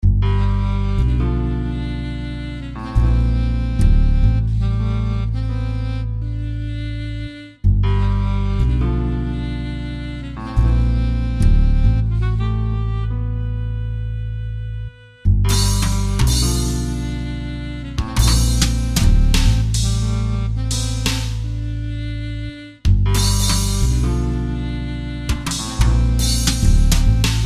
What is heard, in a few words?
jazz